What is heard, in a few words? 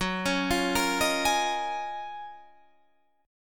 F sharp Major 13th Guitar DADGAD